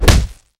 knockback, player attack; Minecraft; Votive World

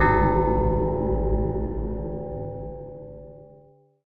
bell use; Minecraft; Votive World

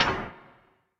open, iron door; iron trapdoor; Minecraft; Votive World

